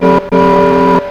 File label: train whistle